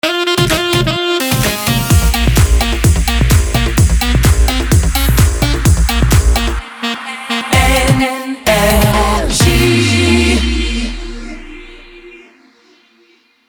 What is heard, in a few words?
Jingle Ramp; NRJ